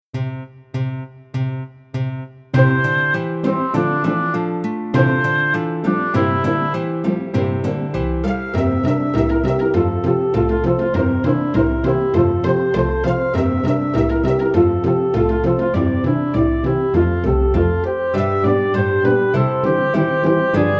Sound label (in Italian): Pizzicato Polka, orchestra di classe